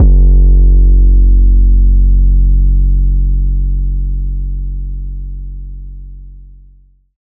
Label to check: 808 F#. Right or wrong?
Right